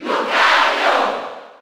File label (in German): Cheer German; Lucario; SSB4